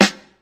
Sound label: hot steel snare drum sample g sharp key